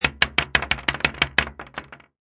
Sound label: Bullet Shell Drop Machine Gun Multiple Hollow Wood; Multiple bullet shells drop: Machine gun multiple empty shells fall on hollow wood